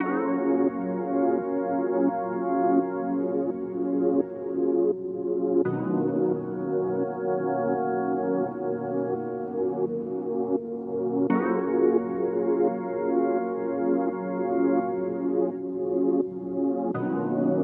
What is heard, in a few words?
COUNTRY CHORD 85